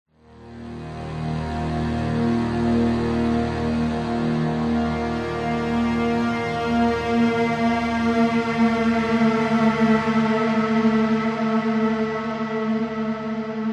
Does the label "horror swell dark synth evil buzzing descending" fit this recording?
Yes